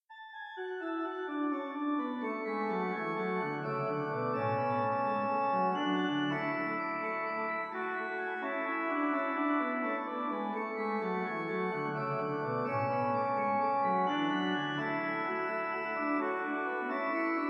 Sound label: Bach, organ; BWV 608; In Dulci Jubilo